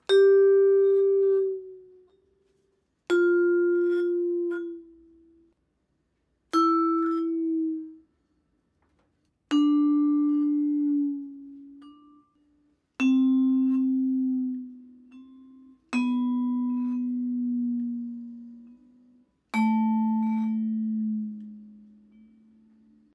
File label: vibraphone bend